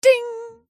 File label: ding